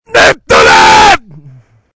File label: msg Tone